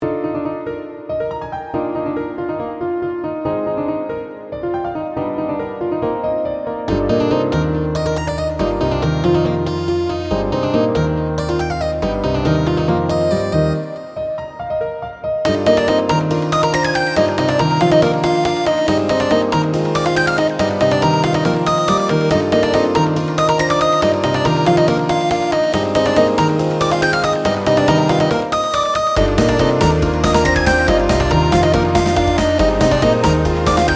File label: unique boss battle